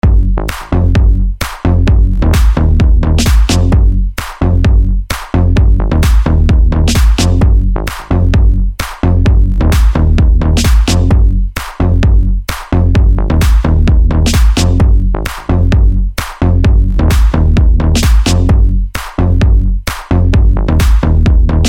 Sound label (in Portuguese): base mega funk mista house bass; pack bases mega funk 2020